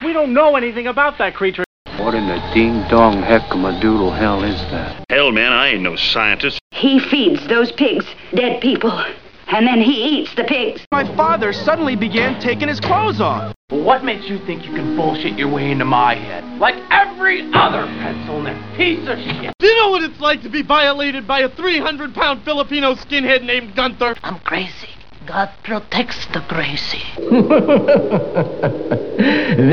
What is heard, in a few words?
cuts beats breaks samples stuff; threepeeoh